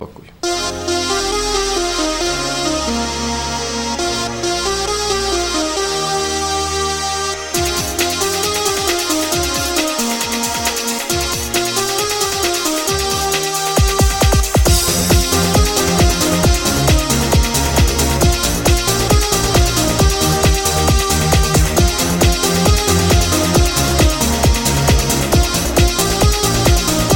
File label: Disco polo